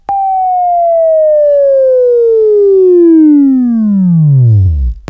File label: WAV Right, Sweeping; Sweeping Training program